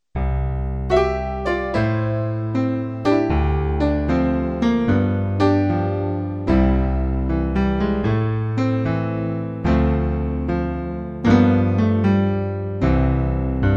Improv chords Solo

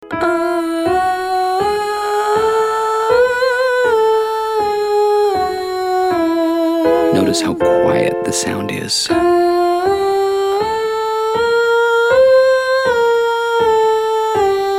mezza voce Female

